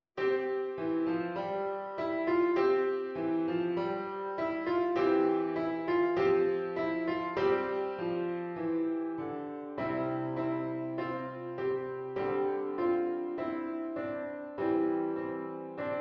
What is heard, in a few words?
Piano Ode to Joy